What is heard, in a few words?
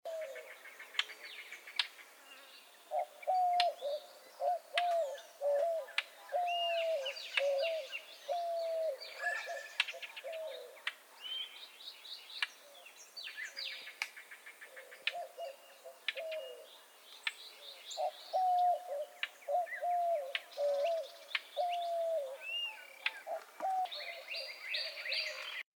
Yellow-breasted Chat, Icteria virens auricollis; warblers